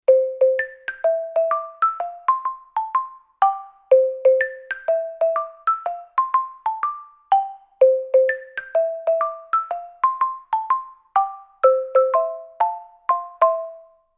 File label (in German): SX 1600 - mit zwei Schlägeln; studio-49